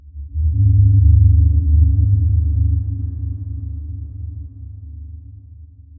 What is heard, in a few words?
reverb tail low ambient